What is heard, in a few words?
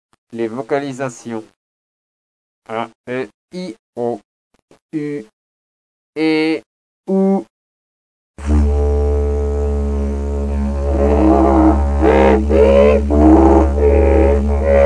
vocalisation, didje